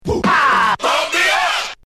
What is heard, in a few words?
scratch